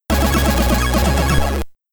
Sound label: Level Completed; Tube Madness; Commodore 64